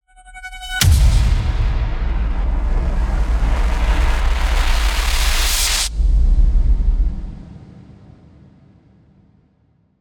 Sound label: Horror Impacts - LFO Thunder; SoundMorph